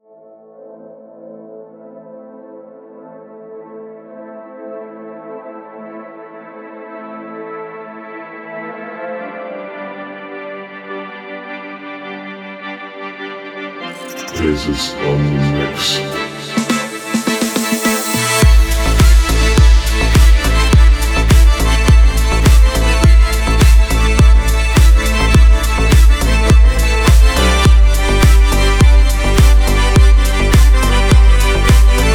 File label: Dhol